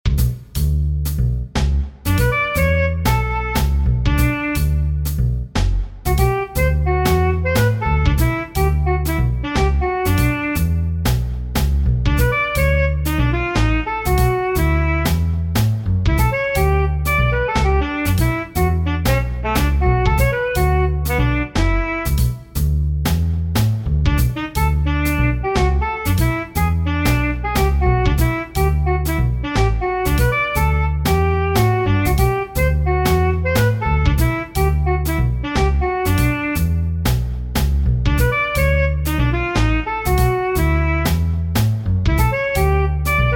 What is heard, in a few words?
Sax; Jazz Master; E Rock